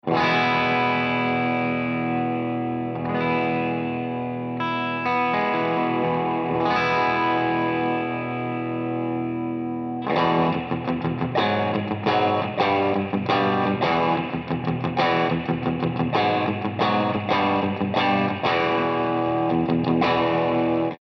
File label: REVERB P90